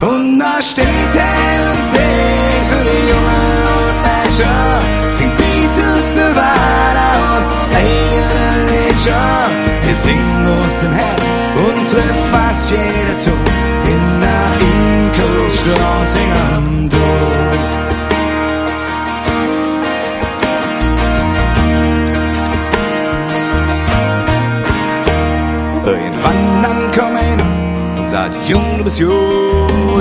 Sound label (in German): live mit orchester